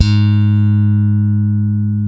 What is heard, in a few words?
bass, G#3; Spectrasonics; Bass Legends CD 1